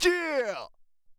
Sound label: Sin Pop